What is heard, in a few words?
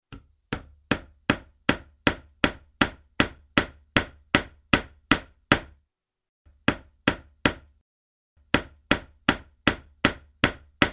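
hammering nails with a hammer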